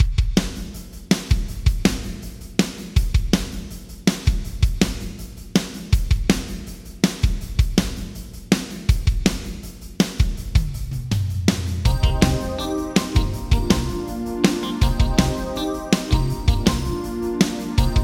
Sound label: Drums Yamaha ProR3 Short Perc Plate; Vintage Digital